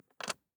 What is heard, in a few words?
DICE on Glass, Grab, Standard, 4 Four Dice; Samples; ArticulatedSounds; DICE Sfx Party (Extended)